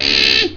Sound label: pain; Alien Arena; drfreak